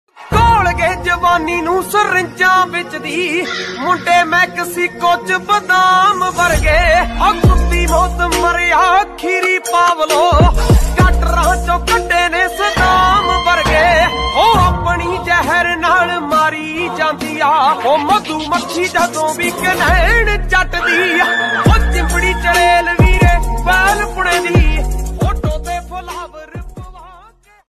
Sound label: Punjabi Ringtone